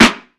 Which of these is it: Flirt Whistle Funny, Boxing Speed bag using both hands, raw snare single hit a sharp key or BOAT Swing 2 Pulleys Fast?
raw snare single hit a sharp key